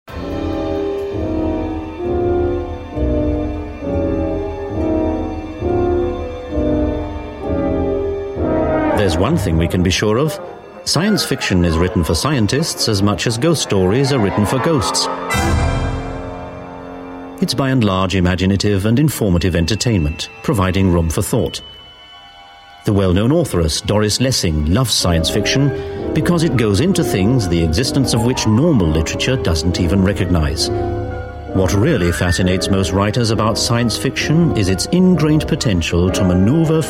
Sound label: sciencefiction